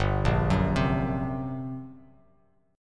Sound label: G Minor Major 7th Double Flat 5th, G, Bass Standard; arpeggiated; JGuitar